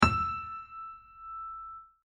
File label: E6 audio music note